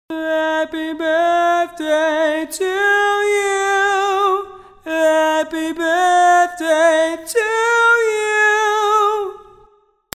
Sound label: head voice